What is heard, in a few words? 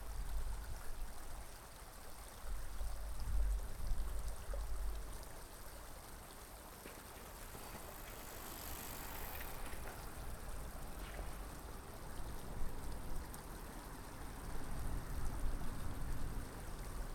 Stereo, walk; Field recrding walk